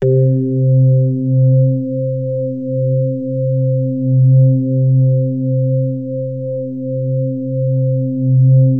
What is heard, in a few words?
Access Virus B; Synthworx